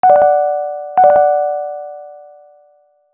system video calling, BlackBerry; BlackBerry OS-10

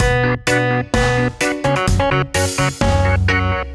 Reggae Dub, ringtones; Google; NewWave Labs

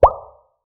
volume adjust

